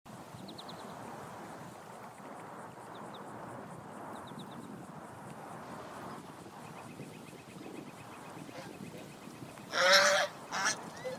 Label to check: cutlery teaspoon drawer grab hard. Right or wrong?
Wrong